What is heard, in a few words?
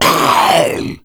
fast zombie idle